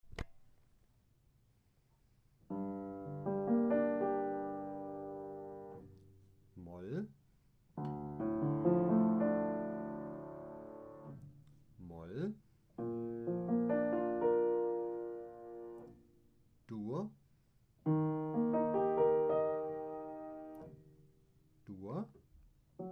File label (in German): dur moll; gehoerbildung